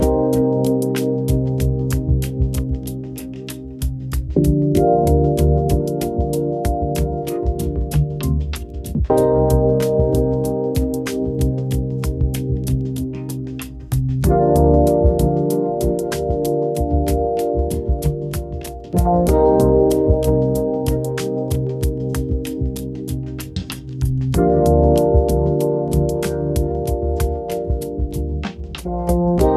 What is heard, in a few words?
lo-fi funk groove; 40 sec